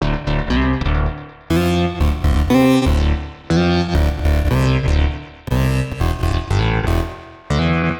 120 F Prophet, Analogue Bass; Loops; Touch Loops; CosmicFunk

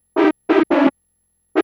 TECHNO SYNTH 140; Keys

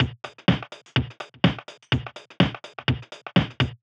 DEEPHOUSE BEATS 125

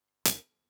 Hats, One Shots; Touch Loops; CosmicFunk

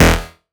synth shot E; Electro; Anthemic; synth shots

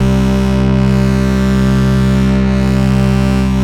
FIVER C3, SYNTHS; SE-1

